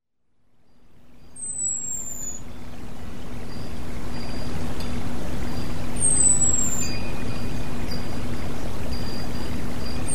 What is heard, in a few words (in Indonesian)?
terapi alam anis merah betina